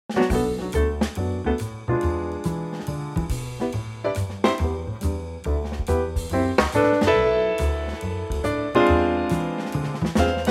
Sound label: G Trio